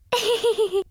giggle

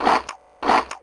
eat fast

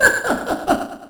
chuckle; jevil

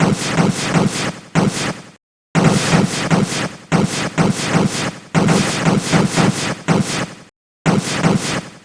club bomb

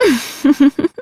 giggle

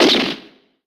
gunshot

